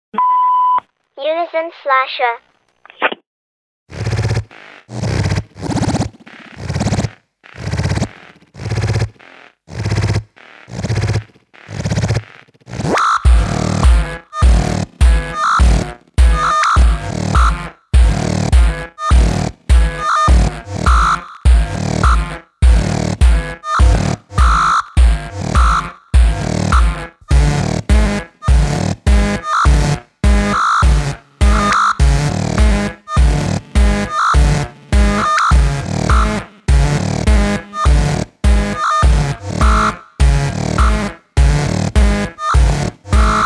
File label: Unison Slasher